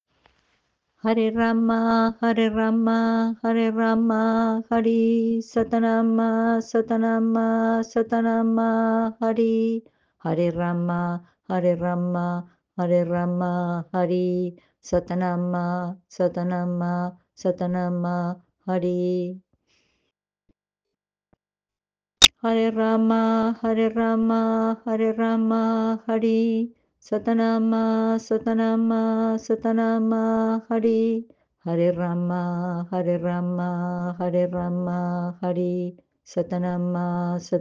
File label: mantra haray raam